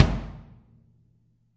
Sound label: real kick; REAL LIVE KITS